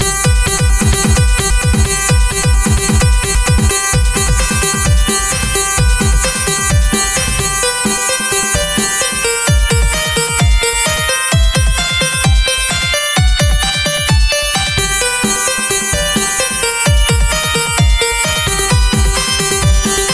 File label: dingaling loop